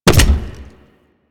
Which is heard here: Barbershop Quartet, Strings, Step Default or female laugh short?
Step Default